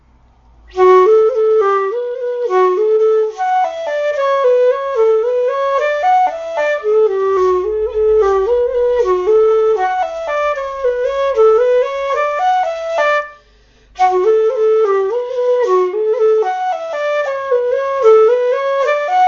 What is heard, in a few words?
Jig